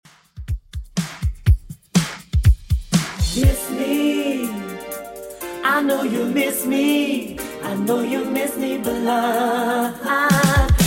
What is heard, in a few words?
80s Redrum, 122bpm